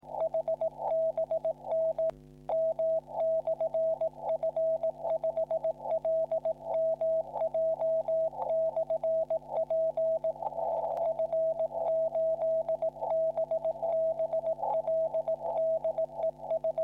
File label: QSO 40m cw